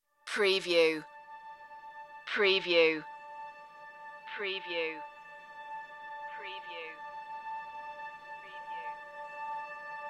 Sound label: red string e